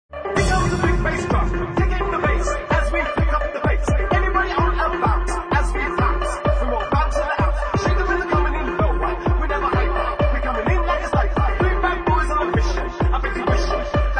big bass drum

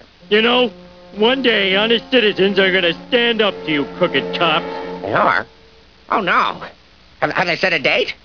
Cops

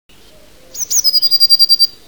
bluetit, birdsong

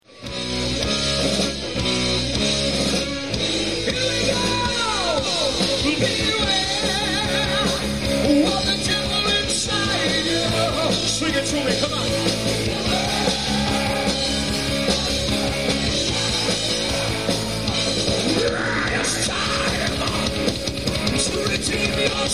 Fire

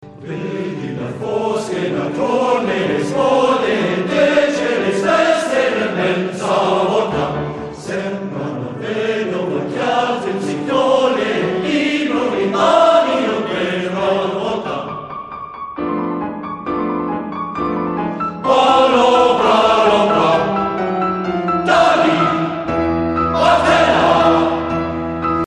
Anvil, Cork male choir; The Anvil Chorus; Blarney, Co. Cork

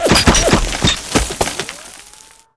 explosion

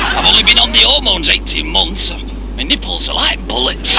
bullets

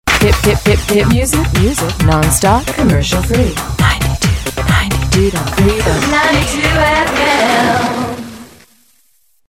hit music

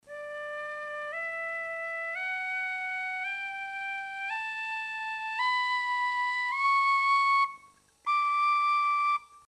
D scale

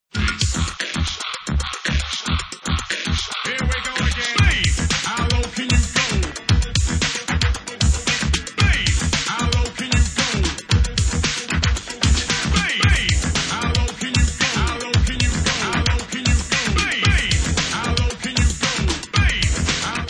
bass how low can you go

